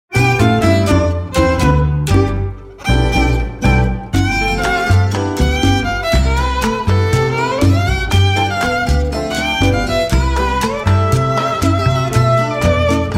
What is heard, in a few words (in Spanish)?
danzon, musique cubaine